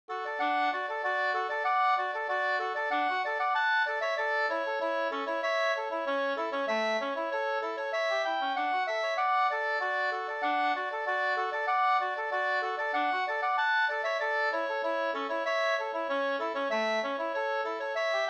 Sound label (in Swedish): snoa Jamtsnoa D G dur